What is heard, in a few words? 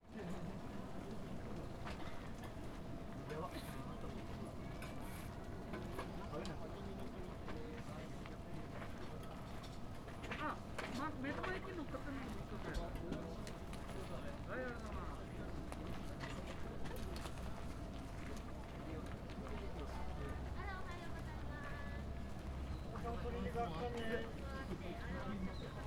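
parking, Parking area in front of Gokoku Shrine; mt shinobu; shinobuyama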